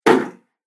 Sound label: Door Closed Small Room; household; Miscellaneous Door Sound Effects; Andrew Fleming